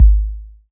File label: Kick Analog Mono